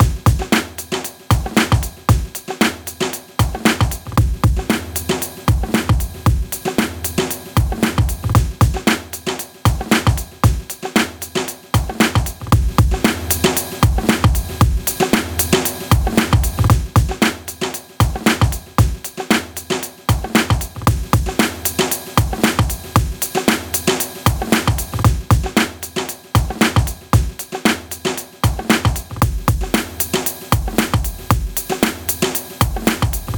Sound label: Compressor on Drums; Nuxx